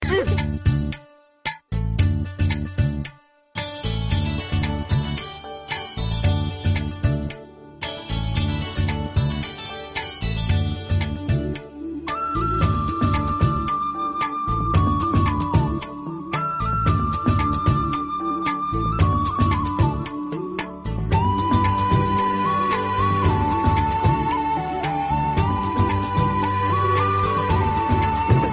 romantic ringtone; bommarillu

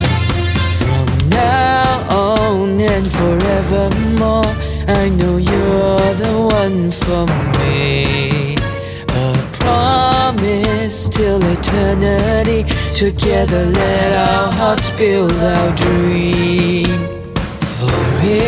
singaporean song ringtone

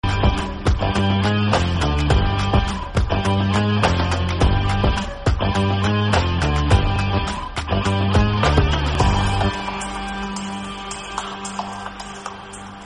theme ringtone; tiger

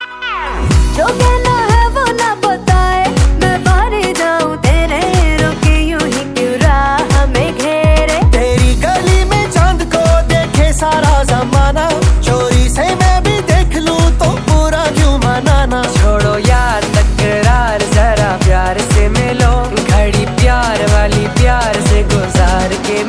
pakistani movie ringtone

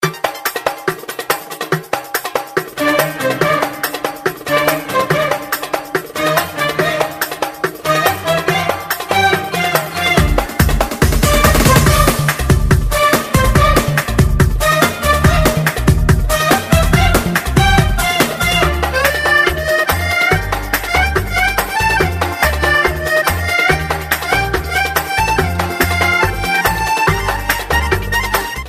arabic beat

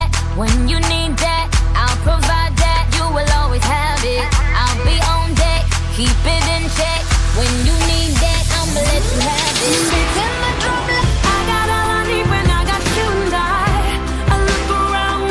australian ringtone

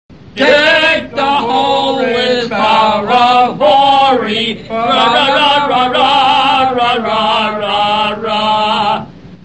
chinese christmas

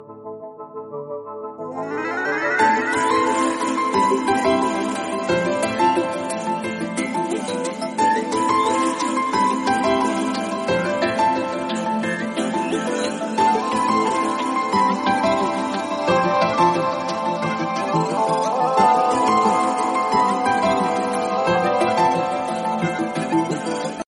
romantic ringtone

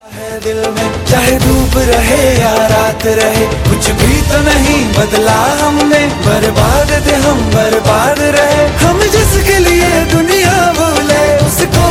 bollywood, ringtone

